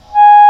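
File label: English Horn mf G#2; Digital Sound Factory Virtuoso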